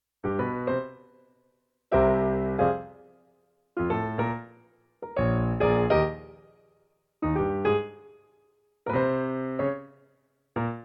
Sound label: swing